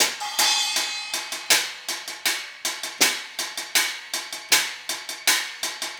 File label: Cymbal and Hi Hat